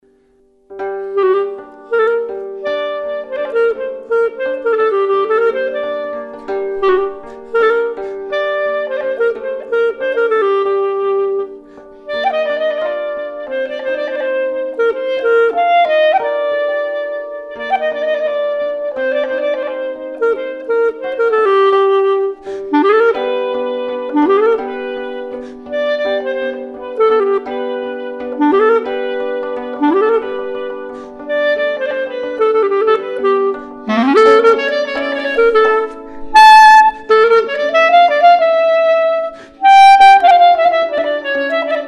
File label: Taxim Medley, Klezmer Band